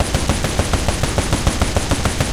loop oerlikon; guns; tanks; War Thunder

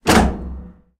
turret klank; tanks; War Thunder

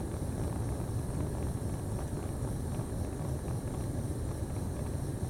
lantern; Rust

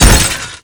glass; Rust

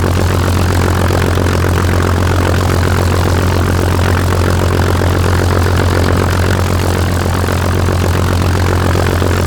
Aircrafts Engines DB605; DCS

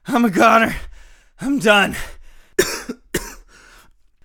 agony; Escape from Tarkov; usec2

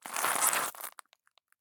stop glass; Escape from Tarkov

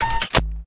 flak gun reload

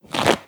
blueprint fail; Rust

